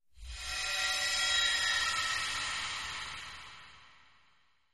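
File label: Animal Stinger Eerie; stingers & whooshes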